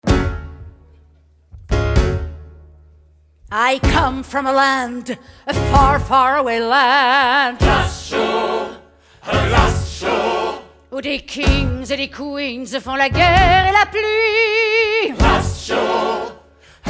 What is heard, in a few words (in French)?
Voix chantee, LIVE, THÉÂTRE MUSICAL, Voix chantée, personnage